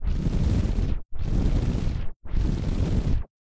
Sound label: Air Duct Loop; doodle jump 2; android